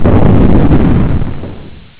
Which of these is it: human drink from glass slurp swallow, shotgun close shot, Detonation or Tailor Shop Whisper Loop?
Detonation